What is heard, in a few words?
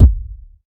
phat bass drum one shot a key; kick